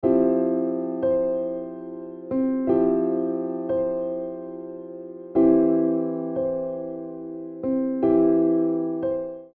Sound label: Jazz